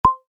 Navigate: Short SFX For Navigating Between Options; Button Sound Effects; Buttons (Sound Effects:Multimedia); Stuart Duffield